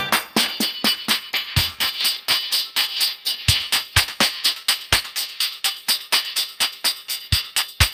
happy jingle; sound effects

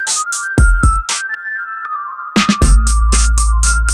tadaa fanfare; sound effects

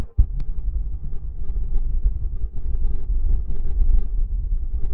heartbeat

